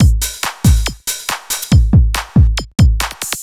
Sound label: hard beats 140, hard trance beats